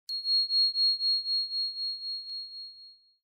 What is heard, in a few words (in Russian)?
effekt chistoty